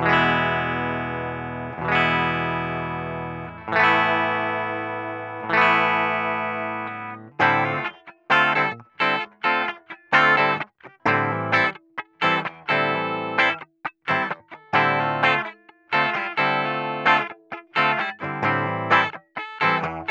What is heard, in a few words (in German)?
Cort X700 Clean Steg Humbucker